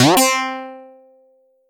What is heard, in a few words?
spring; sonic 3